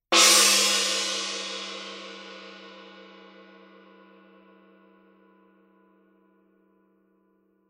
Tiger Med Loud, gong; gongs

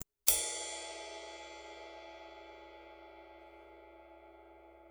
bow, ride cymbal; AA Rock Ride; Sabian

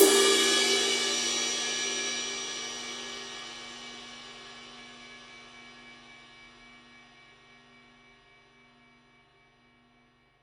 ride edge, Paiste 22" 2002 ride cymbal

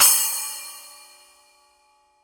splash edge, Paiste 8" Signature Splash Cymbal

